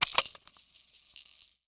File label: Foley Rattle Spin And Shake Short